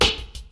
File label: crunchy acoustic snare sample b key